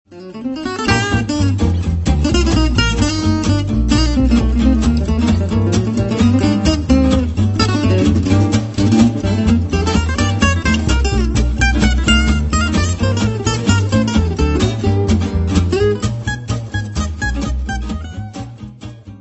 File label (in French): Douce Ambiance